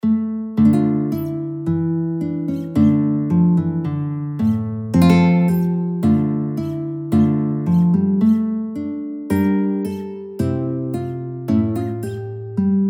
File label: bandurka; Sujita dlja ljutni; VVavilov